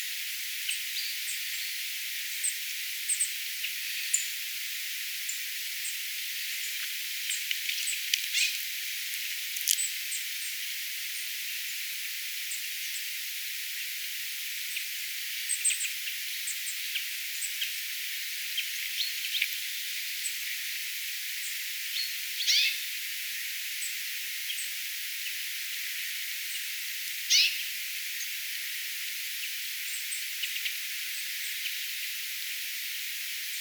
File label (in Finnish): kuvien järripeipon ääniä